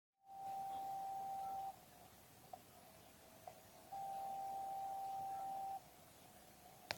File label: beacon